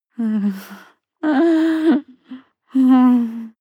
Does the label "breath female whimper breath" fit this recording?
Yes